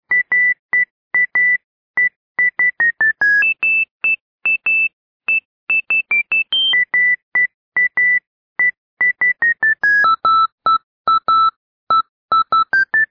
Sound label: siemens; midi; Polyfonisk musik